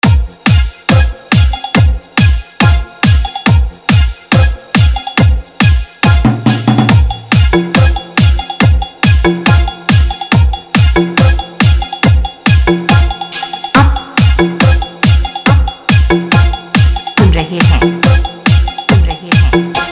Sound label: Killer Testing Beat Competition Song Check